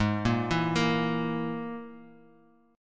Ab Suspended 2nd Sharp 5th Ab Guitar Half Step Down